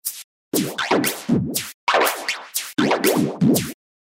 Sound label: rhythm loop Elastic flanger; Sound Graph